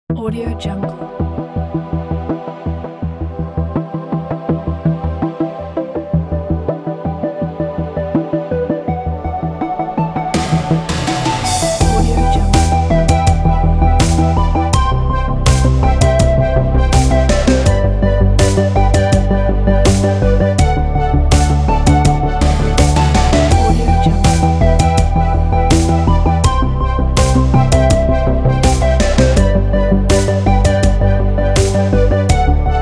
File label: retro mood